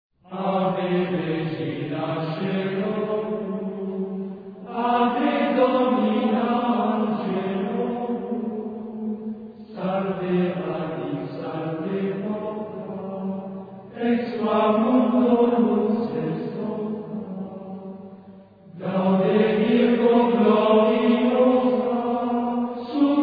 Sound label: Ave Regina Caelorum Simple Tone